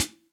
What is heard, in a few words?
Hihat Foot Old School; Drum Hits; Standard Hits; Ableton; Factory Packs; Session Drums Studio